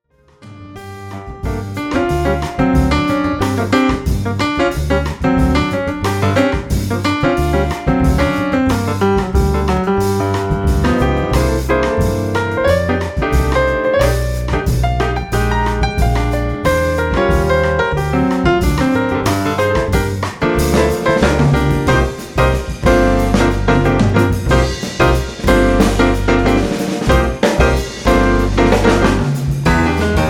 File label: Bossa Nova